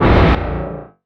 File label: guardian interrupt; dawnbreaker